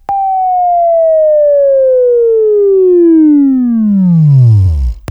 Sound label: Buzz; Labview-Program; Sweeping Training program